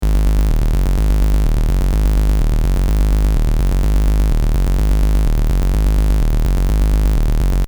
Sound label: just big saw